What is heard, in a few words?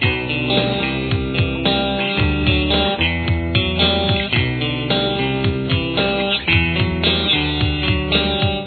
chorus, guitar